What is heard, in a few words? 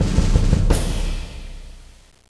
drum